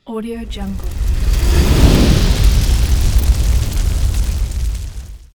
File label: fire reveal